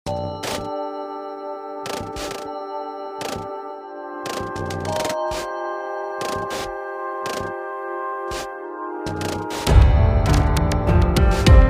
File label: Battle BGM